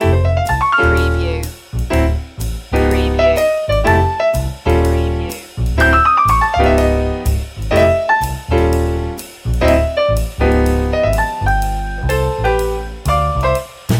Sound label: lounge lizard loop full